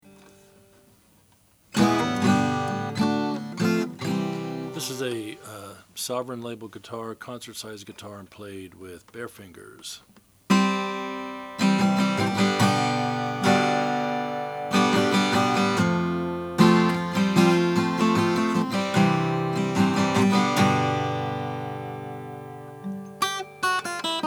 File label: sovereign natural; 1930; pearl